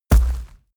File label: hellhound step; schritte